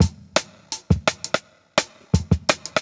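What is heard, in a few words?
Jungle Breaks, 170 bpm; CrispStick; Original Jungle Breaks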